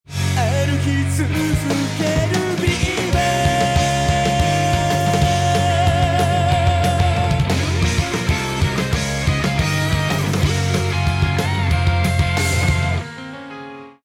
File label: choose team; music kits custom